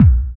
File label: DR. LOOP, DRUMS; X-Static Goldmine 3